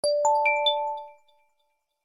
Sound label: high gong; standard ringtones